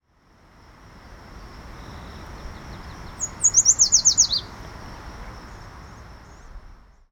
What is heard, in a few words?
Yellow Warbler, Setophaga aestiva